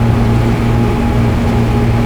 vent fan; school; Tony Hawk's Project 8; XBOX; inschool

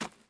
fs dirt, footsteps

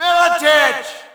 Shout, audience; UFC Tapout 2

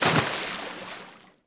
splash small; water splashes

